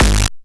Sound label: Crushed Perc, bitcrushed; musicradar